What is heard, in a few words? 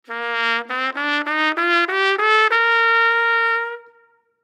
Trumpet, Trumpet Bb Maj Scale Ascend Hold Last Note; Brass; Musical; Blastwave; Sound Effects:Musical